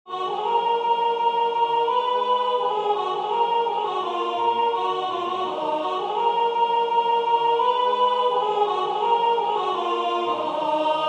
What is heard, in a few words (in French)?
soprane; Accourez choeurs angeliques